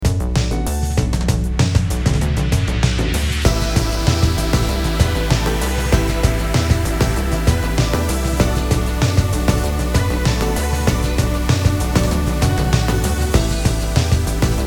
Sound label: upbeat music